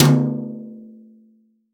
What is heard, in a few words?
TOM, CTD TOM BRSH